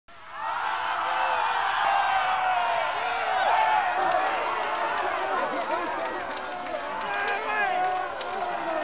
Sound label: battle